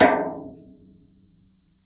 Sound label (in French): Escalier en metal